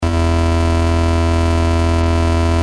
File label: Original Tone